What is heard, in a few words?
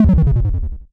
game lose negative